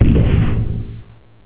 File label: blink arrival; Warcraft 3